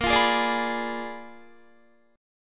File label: Bb 7th Flat 9th Bb, Guitar; Bb7b9; strummed; JGuitar